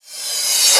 Reverse Cym, Cymbals

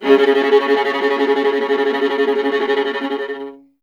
viola C#1, Solo Instruments; Miroslav Vitous; Solo Instruments VOL-1

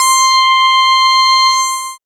c, Euro Style, Dance Synths

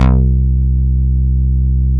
MOOG, Source Bass

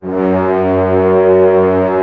ORC F#1 FF, Orchestra; Sound On Sound - Peter Siedleczek's Orchestra

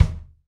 Rock Kick; Roland BK-5

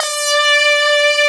SYNTH LEAD; Mostly Leads

